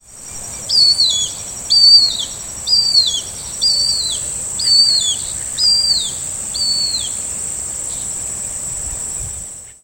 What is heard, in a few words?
Circus buffoni; UY